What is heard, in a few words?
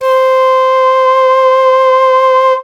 FLUTE